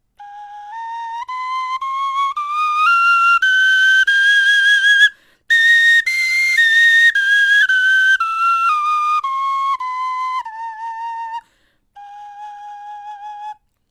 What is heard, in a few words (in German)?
tonleiter, knochenfloeten